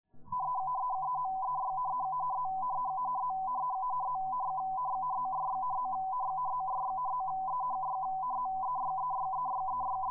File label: CW FSK